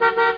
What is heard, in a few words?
klaxon, vehicles; Pinnacle